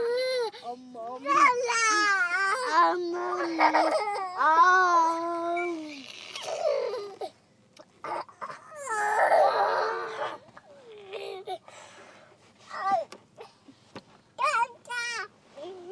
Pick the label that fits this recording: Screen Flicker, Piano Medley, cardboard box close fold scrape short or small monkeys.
small monkeys